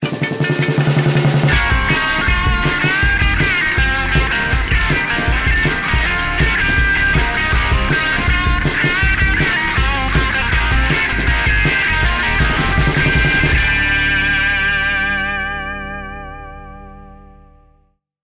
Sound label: Jingle